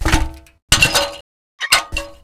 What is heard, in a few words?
reload; Haavy; Guns; FPS Kit Version 2.0